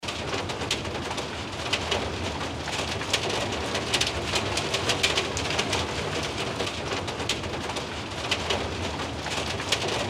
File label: hail indoor